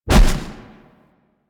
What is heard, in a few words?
Dash Hit; Powers; Warframe; Focus; Operators